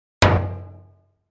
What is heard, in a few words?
Metal 50 Gallon Drum, barrels buckets & cans